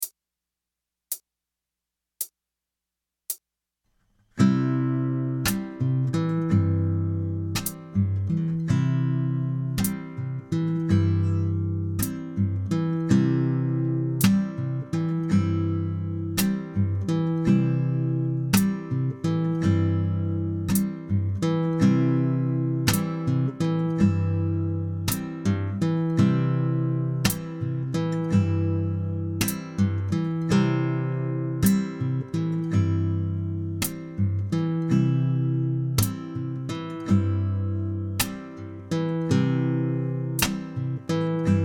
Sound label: C major vamp, guitar; pattern 4 the e shape key of c